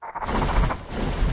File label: moscutter queen idle, monster